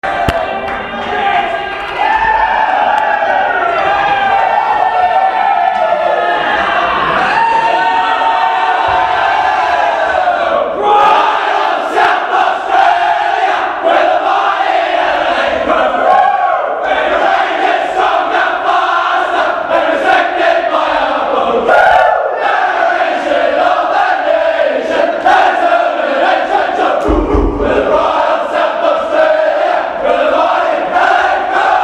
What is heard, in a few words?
team song; SANFL Crows